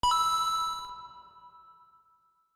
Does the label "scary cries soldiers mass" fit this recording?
No